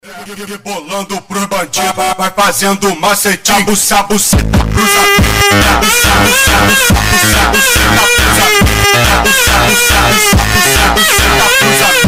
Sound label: slide, trap